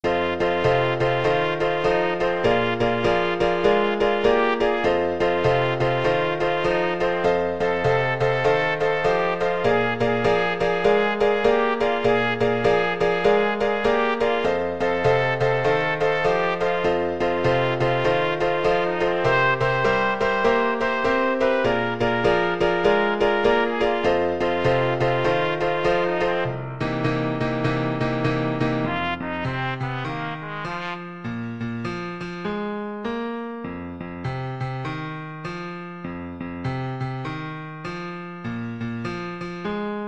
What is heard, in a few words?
style, trumpet